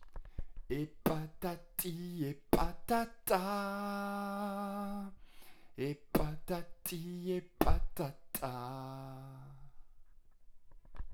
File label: refrain beat box